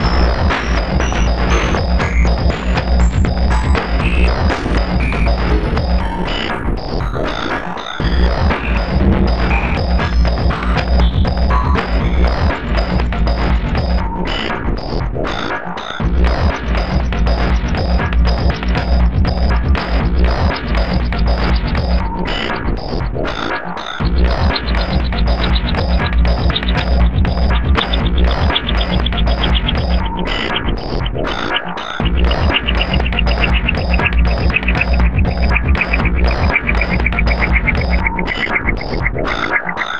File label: Processed Beat, Drums & Percussion